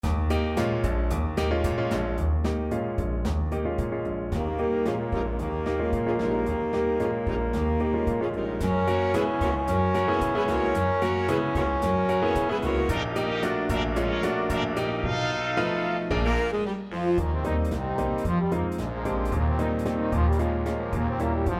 accompagnement Big Band

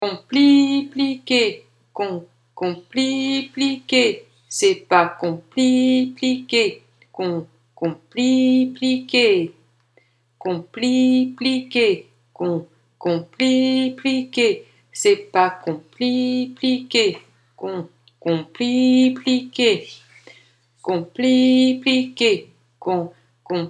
texte percu corpo lent

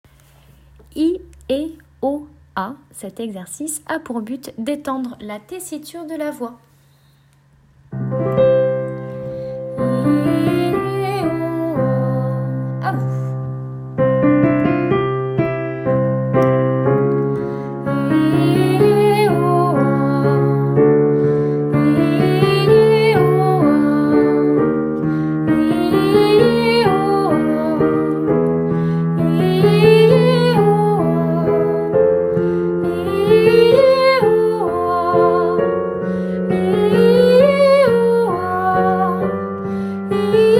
Exercice etendre la voix IEOA

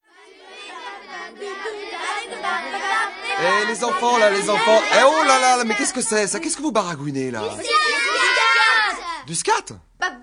scat loups

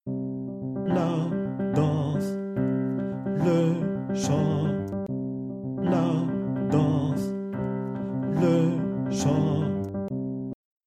ostinato vocal bolero moitie; Le Boléro de Ravel

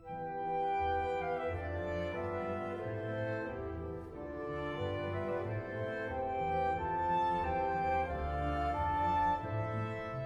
melodie russe mf; Cours education musicale; Voyage en Russie

